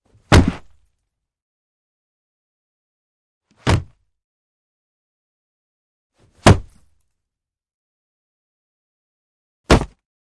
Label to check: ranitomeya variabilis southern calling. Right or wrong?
Wrong